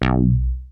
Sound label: DIRTY THUMB, BASS; TECHNO-BOX; SYNTH+BASS